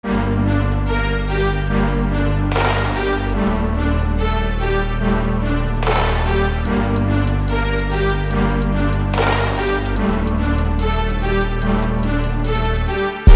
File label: Rap Beat